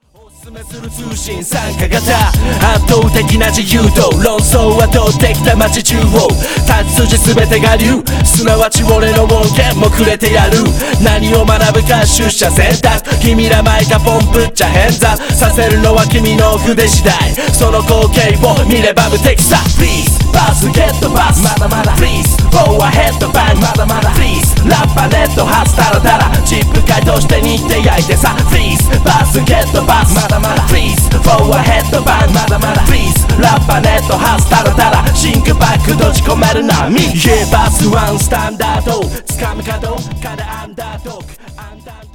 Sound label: startbeat